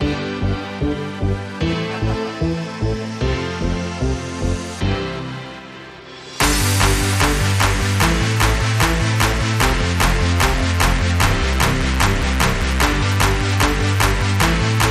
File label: Hardstyle Festival Rhythm